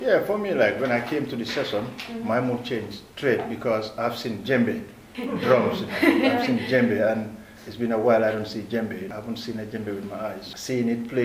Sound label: Djembe